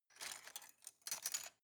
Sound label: cutlery plastic drawer grab soft